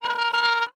Wood Chair Slide Across Wood Floor With Squeak, Household